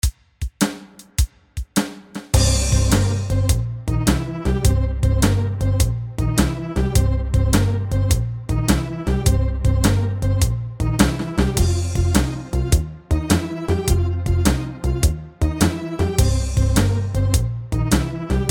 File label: Blues Backing